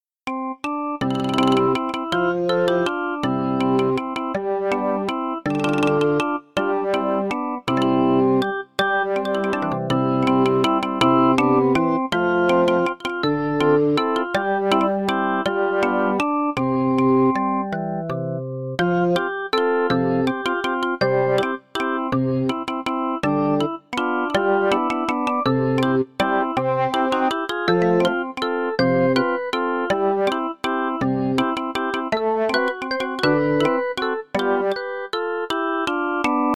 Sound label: Botanical Symphonic; Moon Remix RPG Adventure